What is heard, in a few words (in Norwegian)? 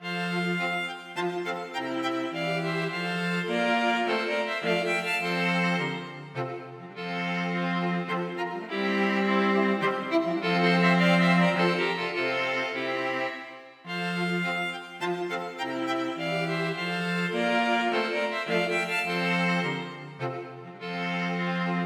Menuet for strykekvartett; Aamodt